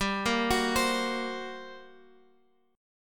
G Minor Major 7th Double Flat 5th, G, Guitar, Open F; GmM7bb5; arpeggiated; 19,17,18,19,x,x